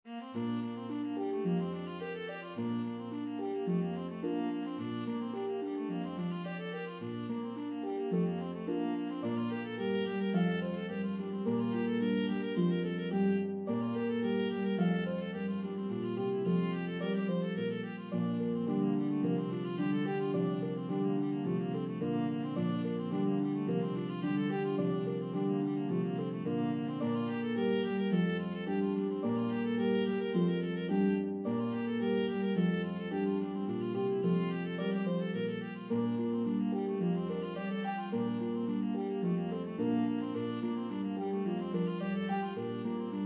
Viola Harp

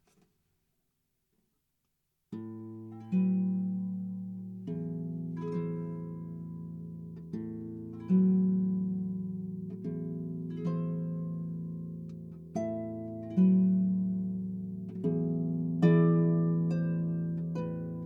Prelude, harp

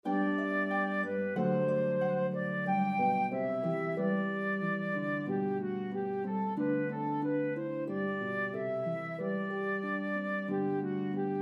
Flute Harp, Duet for Flute & Harp; Shepherds Hurried to Bethlehem

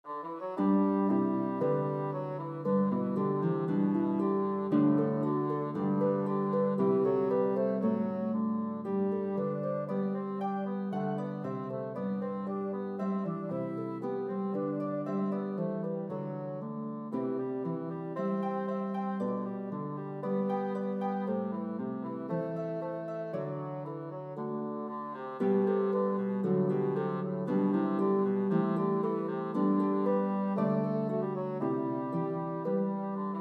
Bassoon Harp; Carolans Concerto

